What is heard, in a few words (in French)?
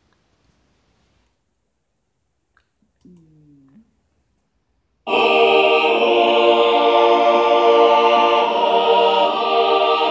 L hymne a la nuit